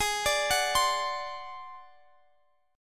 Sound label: G sharp 7th Flat 5th; x,x,x,x,20,21,20,21; down; chord shape